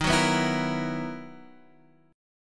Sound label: B Major 11th D sharp, guitar